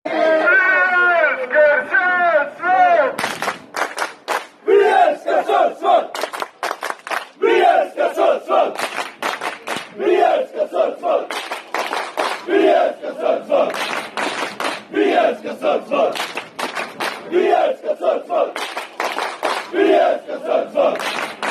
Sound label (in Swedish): vi alskar sundsvall, fanchants